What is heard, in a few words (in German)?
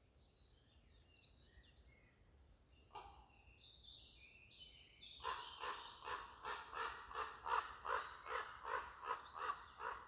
corvus ruf